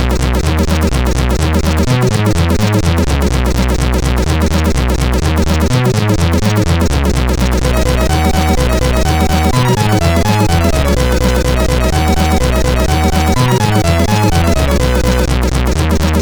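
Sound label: Voice, MOS8580R5 sid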